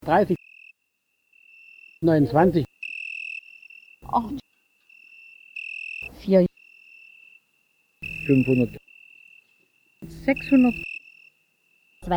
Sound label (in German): grillen transform